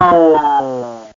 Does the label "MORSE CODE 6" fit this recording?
No